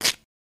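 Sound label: Toast Scratch Rougher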